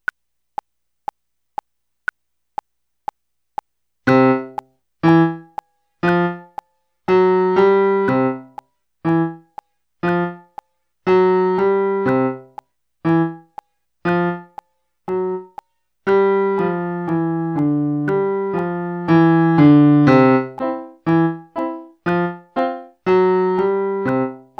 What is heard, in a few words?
primo